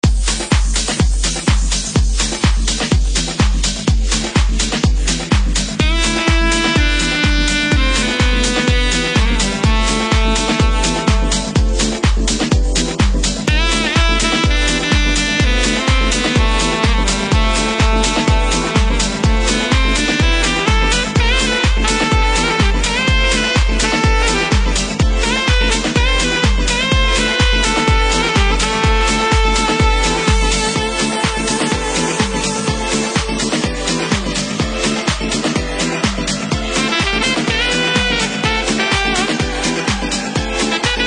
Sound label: Sax DJ